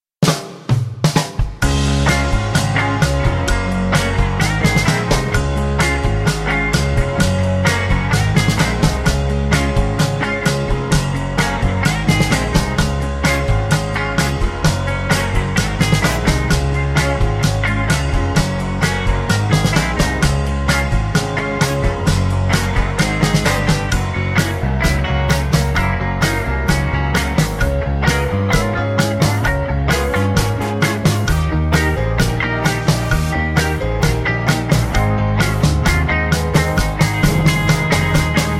(Db) No Backing Vocals

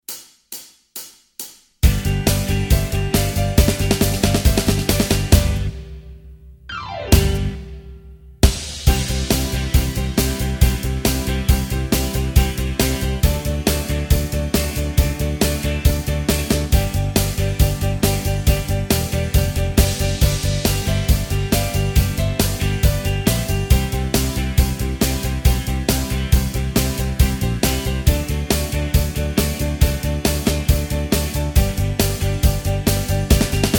G No Lead Guitar, backing tracks

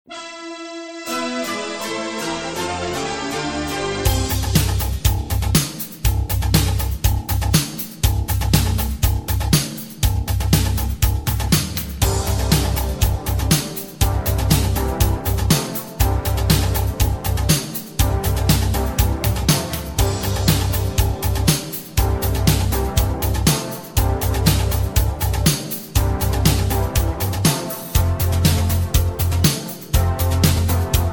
C To D No Backing Vocals, backing tracks

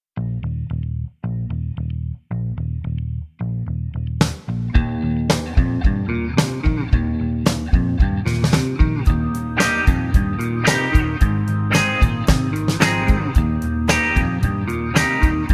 Eb No Backing Vocals, backing tracks

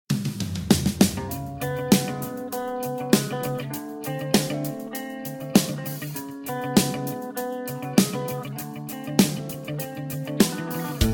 A No Backing Vocals, backing tracks

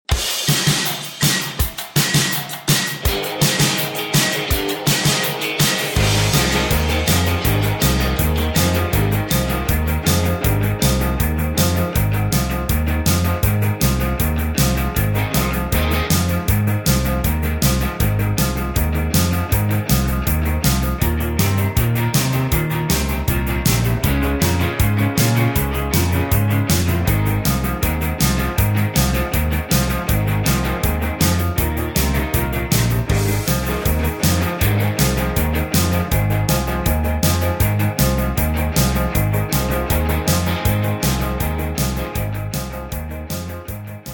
(Ab) No Backing Vocals

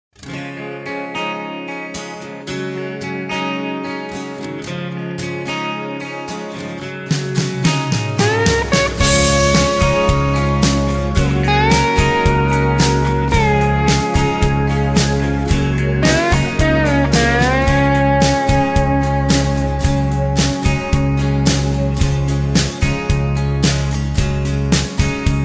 Db No Backing Vocals, backing tracks

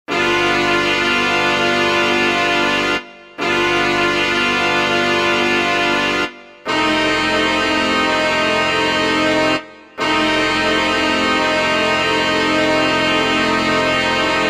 C No Backing Vocals, backing tracks

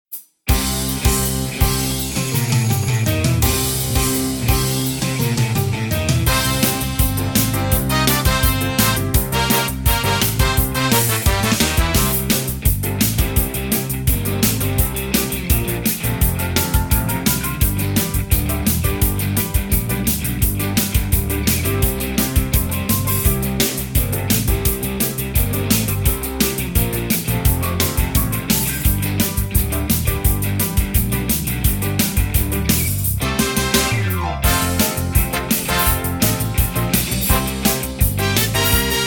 No Backing Vocals

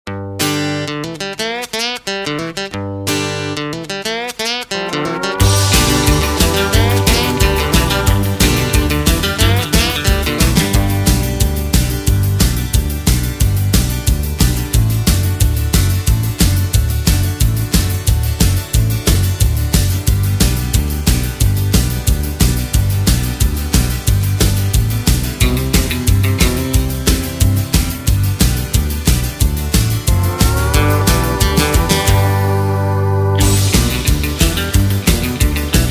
Get Your Feet Down (G) No Backing Vocals

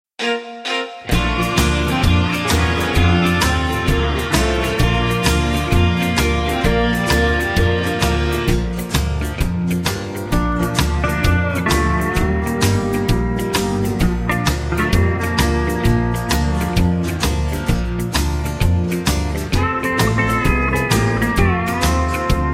Bb No Backing Vocals, backing tracks

